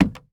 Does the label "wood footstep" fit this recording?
Yes